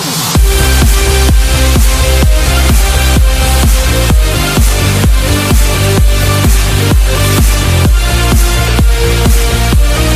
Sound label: Mobile Ringtone